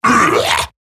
Pain Eximus Grineer Male; Battle Barks

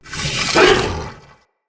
Dog Attack Bite; Vocals; Corrupted Battle Dog

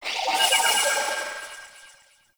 Ship Market Data Blips; Warframe; Corpus Ship Remaster

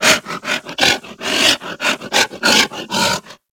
Kubrow Feral Vocal Breath Run; Enemies; Warframe

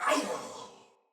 Death, Barks Battle Soldier; Sinecure Soldier

